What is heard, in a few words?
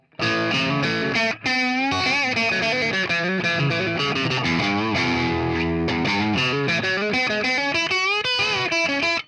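Jaguar saturated; fender classic player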